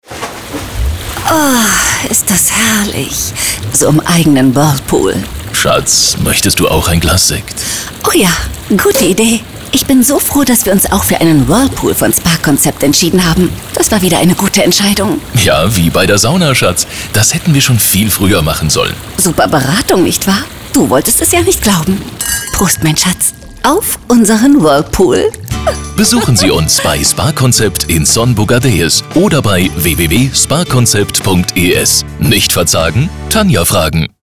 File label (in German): neuer Whirlpool; Spa-Concept